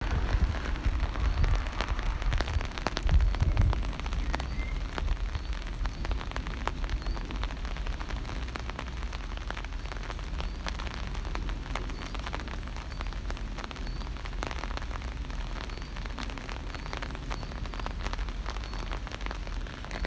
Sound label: rainy day in LONDON; 天气